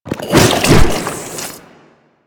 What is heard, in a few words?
Infested Ancient Strike Flesh; Warframe